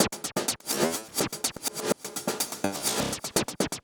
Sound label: Mosquito 125, drums loops; RoBKTA Sample Pack Supreme